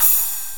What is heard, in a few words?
Splash Cymbal; Drums